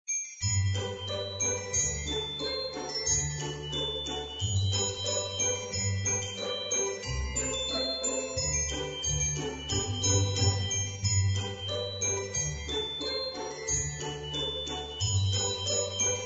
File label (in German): Playback das klinget